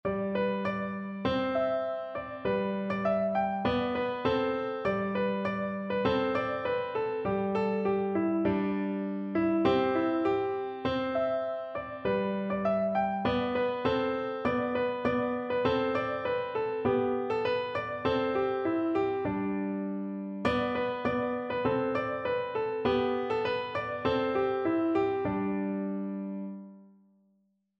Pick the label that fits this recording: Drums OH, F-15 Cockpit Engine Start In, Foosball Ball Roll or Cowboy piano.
Cowboy piano